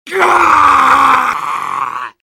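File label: sniper pain critical death; tfc; svencoop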